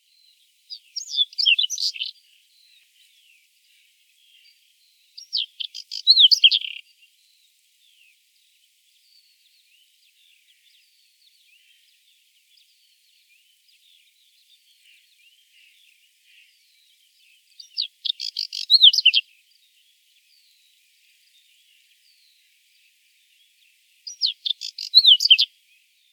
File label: Whinchat